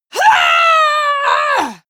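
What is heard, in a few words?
female combat attack long, screams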